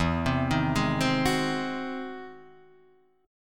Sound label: E Augmented Major 9th, Guitar, Drop D